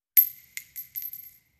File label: Shell Case Sngle Revb, shell casing movement